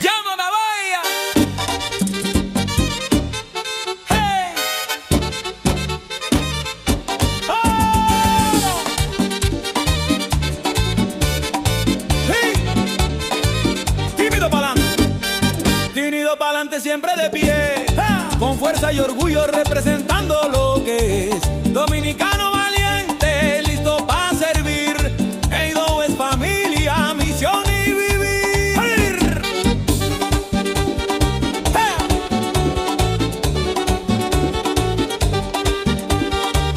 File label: Version Merengue